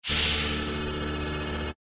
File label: science fiction door open air release electronic